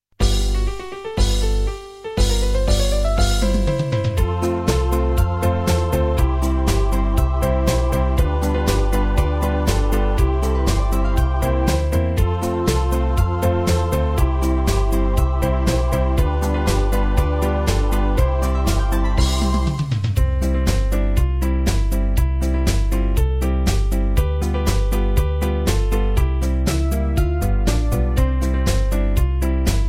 zirkuslieder in unserm zirkus playback

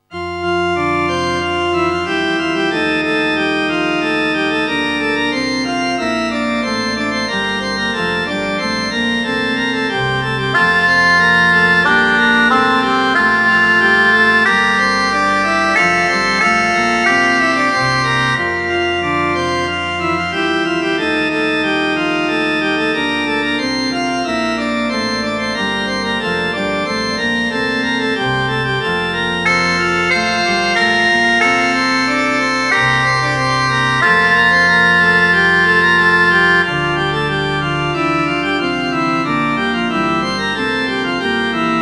nun danket all und bringet ehr, orgel

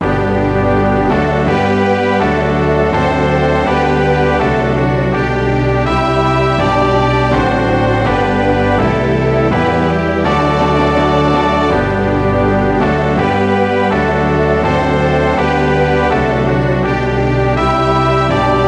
nationalhymne g dur ttbb orchesterpartitur

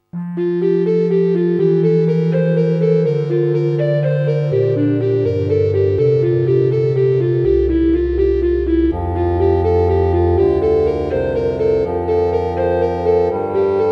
nun danket all und bringet ehr, orgel

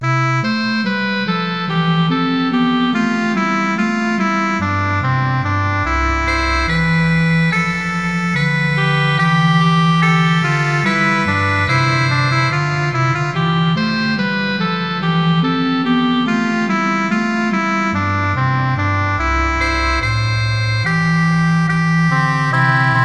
vom himmel hoch da komm ich her orgel; var 02

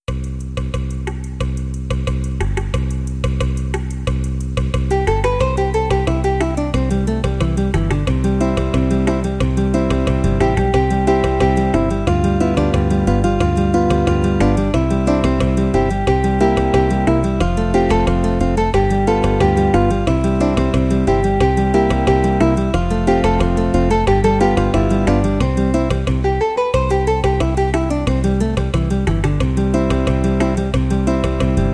kinderlieder playback

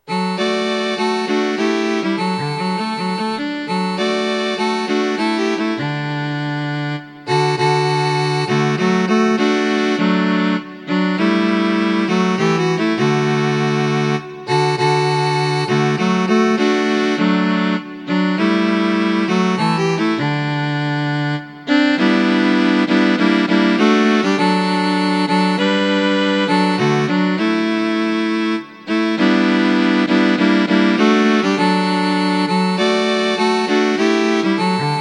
violine u viola; am brunnen vor dem tore liedfassung

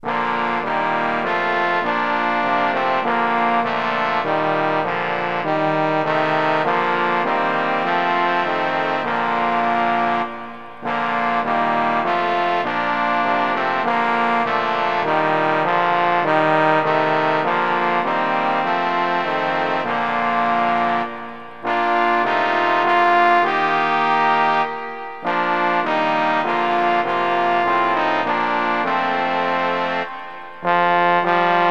lobe den herren ttbb posaunenquartett

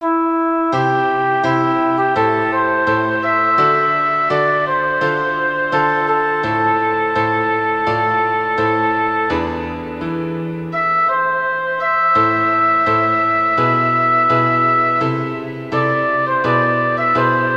floete u klavier

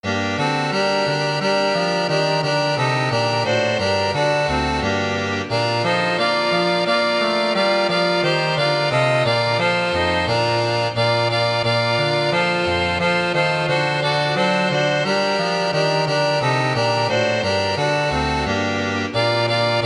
nationalhymne slowakei streichquartett

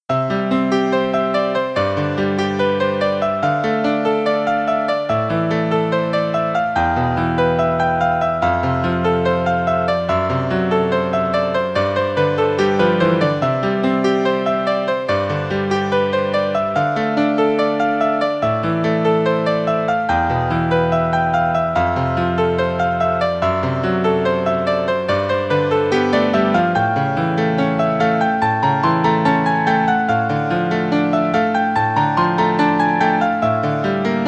zirkusmusik

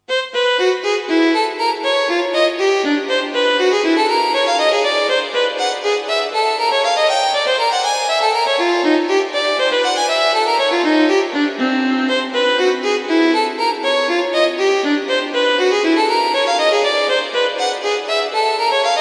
solosonate fuer violine rondo allegro; 4 satz

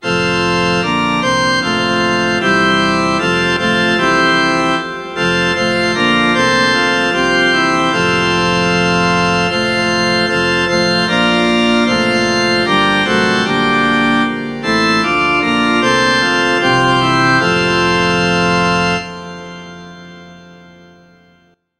orgel choral; nun danket all und bringet ehr

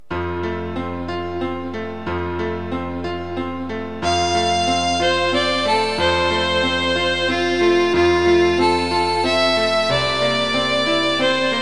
serenade violine u klavier; toselli

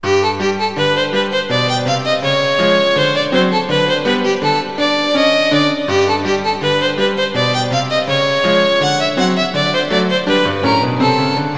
violine u klavier; spielmusik

